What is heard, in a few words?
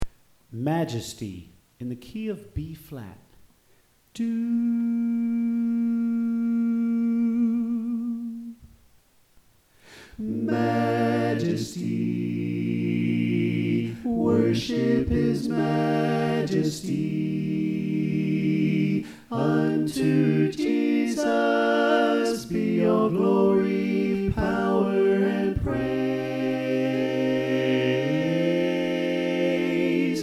Soprano Left